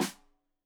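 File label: Snare Stereo, Drums; Deeply Sampled Drums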